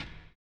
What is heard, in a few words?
LO FI HH; USB Soundscan vol.11; AKAI